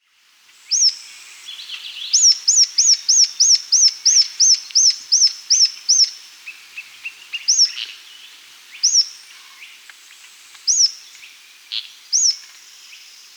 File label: Rough-Legged Tyrannulet (Phyllomyias Burmeisteri), Andean Birds; S. America